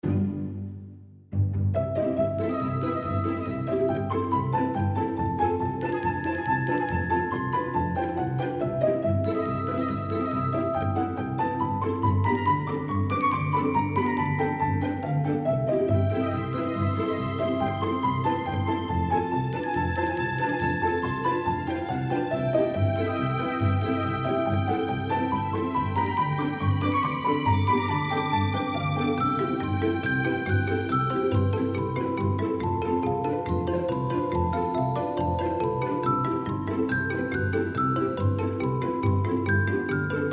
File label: retro party